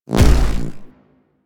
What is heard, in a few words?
Hoverboard Land; Warframe; Vehicles; Venus; anim sounds